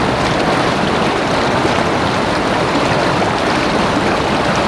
water stream; ambient; Counter Strike Model Pack; CS:GO; nuke

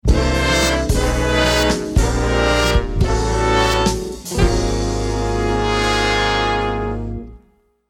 lost round, music

